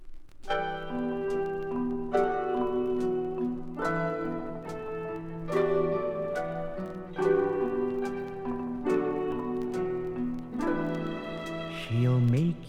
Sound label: Jamaica Sweet Soul Dancefloor Funk Disco